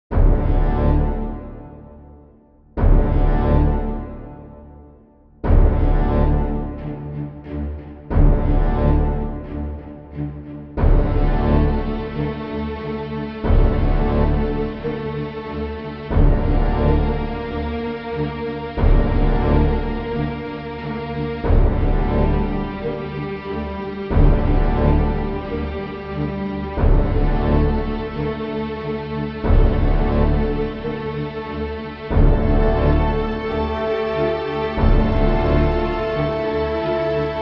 BGM Danger; GF GGX

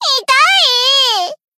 Battle Damage; Mutsuki

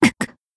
Battle Damage; Mina

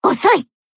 Battle Shout; Iori

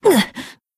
Battle Damage; Mina

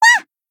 Battle Damage; Meru

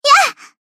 Battle Shout; Koharu

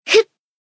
Battle Shout; Hinata

